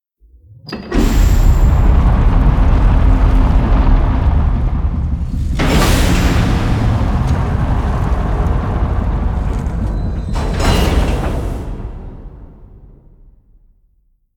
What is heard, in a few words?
Lava Door Open